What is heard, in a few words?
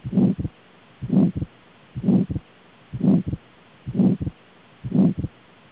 Mid Systolic Pulmonary Stenosis